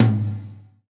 GATE TOM, Drums Acoustic

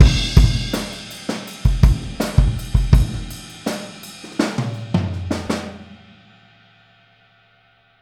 Boogaloo - Outro - Kick, 82 BPM

